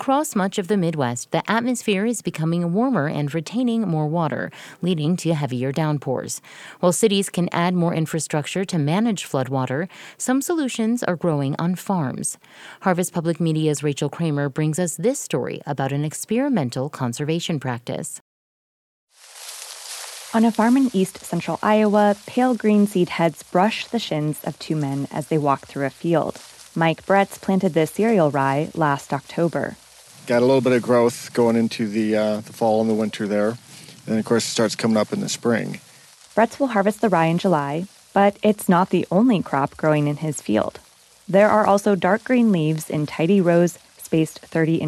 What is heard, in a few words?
rain; Midwest